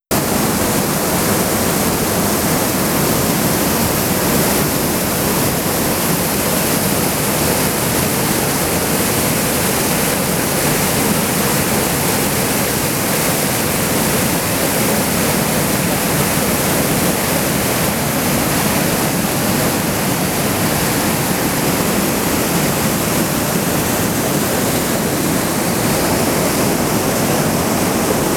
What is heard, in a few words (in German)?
Wasser; Riezlern